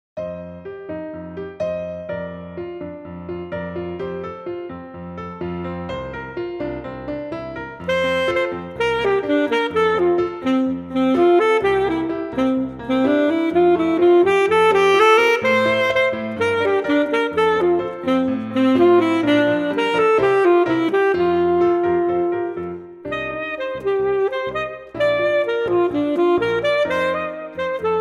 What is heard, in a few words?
Sweet Corn Full Sax